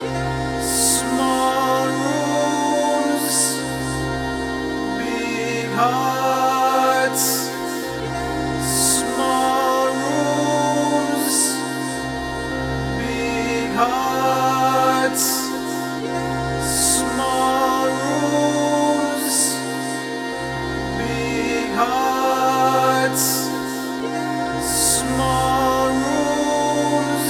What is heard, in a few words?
120 C Big Hearts